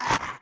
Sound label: hurt; FlyForInsanity